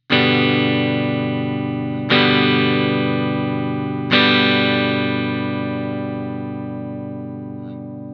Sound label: chord g major, guitar